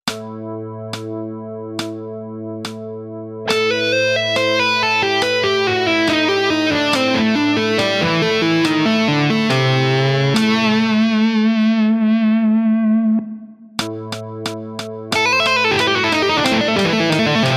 tapping licks

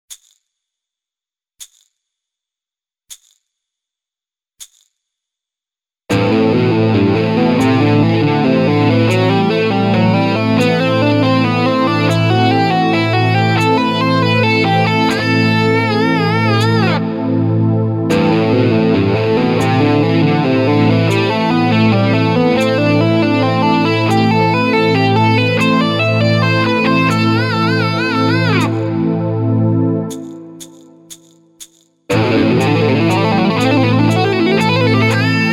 Metal Legato

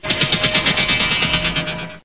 Sci-Fi

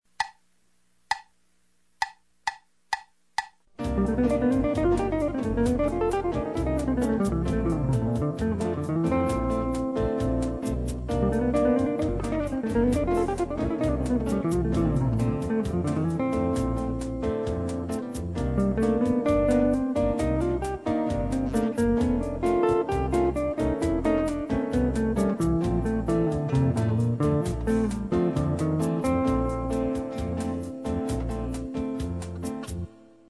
Jazz riffs

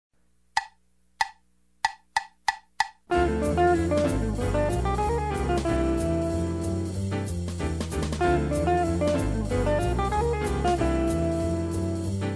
Jazz riffs II7 V I bebop progression

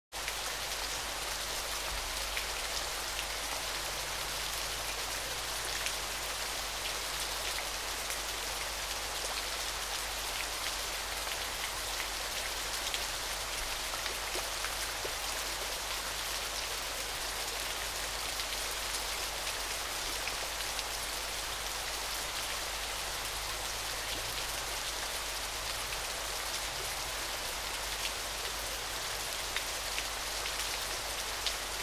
Rain

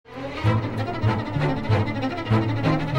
dog

